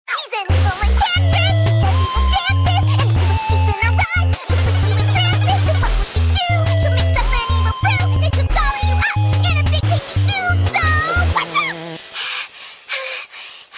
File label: 8bit; Pinkie Pie; Evil